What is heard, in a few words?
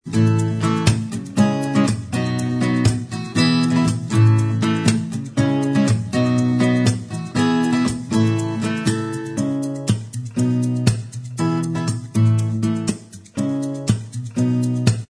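acoustic version Inst Track, instrumental track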